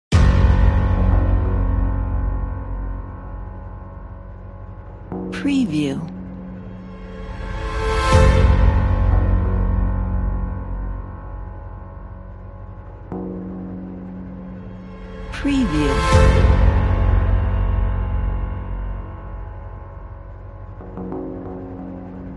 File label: cinematic hybrid trailer